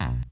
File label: synstab, Synth